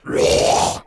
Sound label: groan, zombies